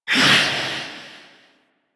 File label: Vocal Melee; Warframe; Wraith